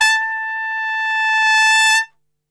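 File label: A 2 trumpet; Best Service; Brass Super Section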